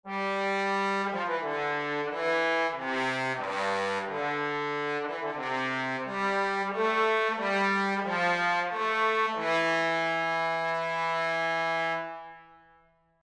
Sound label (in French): trombone; borodine